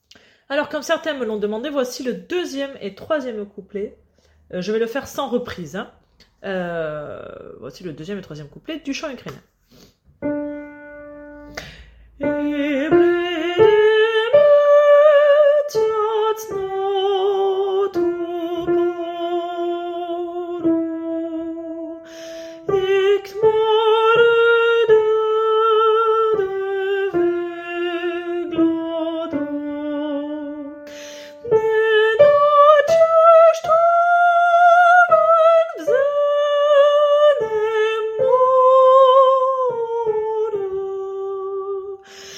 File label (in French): Chant Ukrainien Soprane et Mezzo; couplet 2 et 3